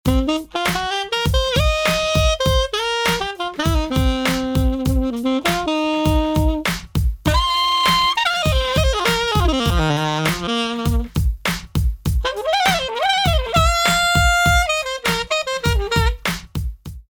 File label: Sax